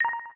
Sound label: Star, Keytone sounds; LG GD550 Pure